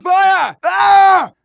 fire, player; Team Fortress 2

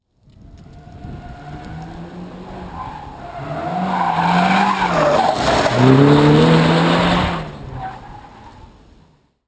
Driving Sound

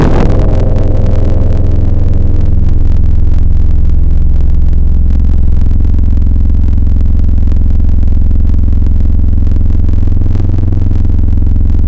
Futuristic, Tropical Mallets; Synthetic's Serum Collection; SparkPackers

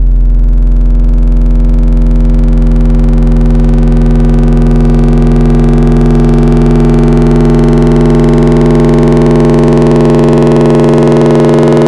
Triangle, Wavetables; Synthetic's Serum Collection; Cymatics - Jawz for Xfer Serum

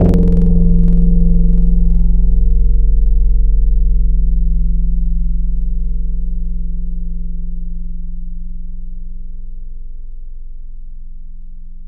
Chroma, Tropical Mallets

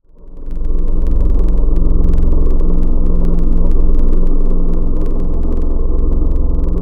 Vocal Cheap Choir; Wavetables; Synthetic's Serum Collection; ESW Core Wavetables; Echo Sound Works Core Tables

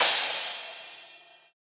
crash; Happy Tekno Track Contest SamplePack

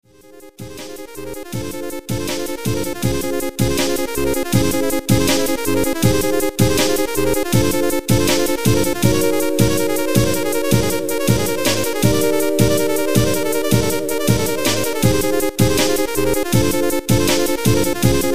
Victory Fanfare; Final Fantasy VII

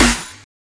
snare; Happy Tekno Track Contest SamplePack

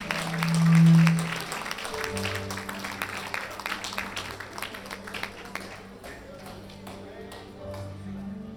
Applause And Interlude Music; lolicore; First Sunday Of March

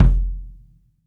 kick; Synthetic's Serum Collection; Attacks Misc

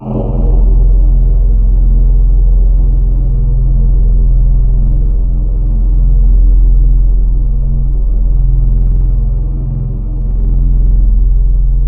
Flutey; Instruments; Synthetic's Serum Collection; Echo Sound Works Core Tables

